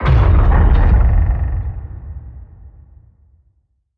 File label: submarines engines; World Of Warships